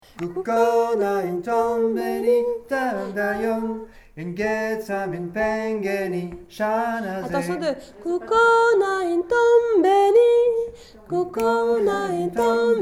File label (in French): basse hommes